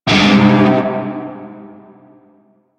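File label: Shawzin Minor Chord K; Music; Warframe; Narmer Shawzin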